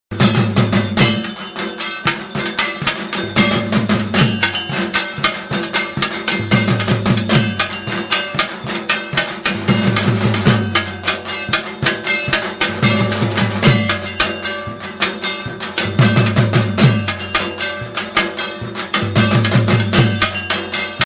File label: dhak music ringtone durga puja